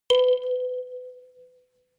notification kalimba rattle octave high

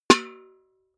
metal bucket hit ring hard

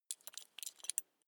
metal belt buckle clatter soft

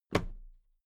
toolbox close drop lid soft

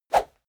whoosh small

